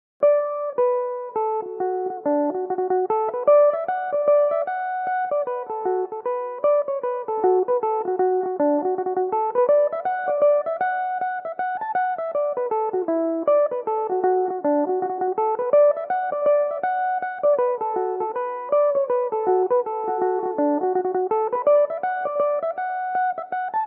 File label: reel D major, Irish mandolin; The Night Of The Big WInd; Belmuse EM-190 electric mandolin; Vincent Broderick